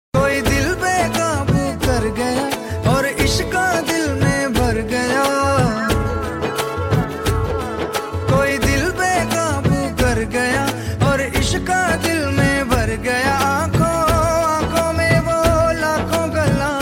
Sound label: ringtone